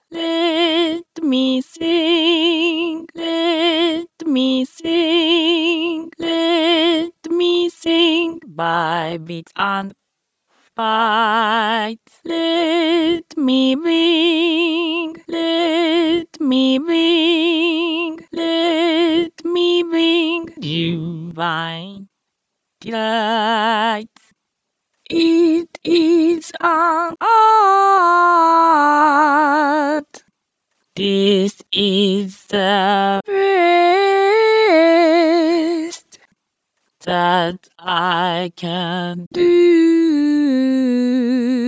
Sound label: sing female